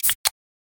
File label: opening drinking can